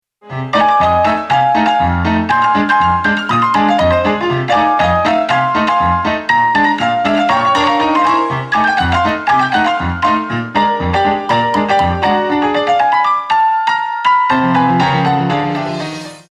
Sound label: ragtime; copilot